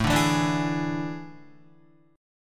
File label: A Major 7th Suspended 4th, Guitar; AM7sus4; strummed; JGuitar